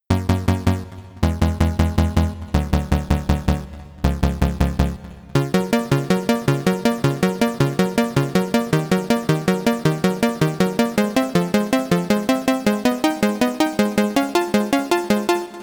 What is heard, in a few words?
arp 2 octaves; the transmitter soundset